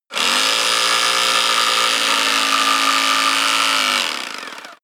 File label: jigsaw on load long; maschinen; saege